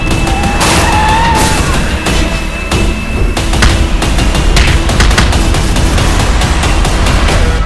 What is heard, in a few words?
Instrumental; Ayngaran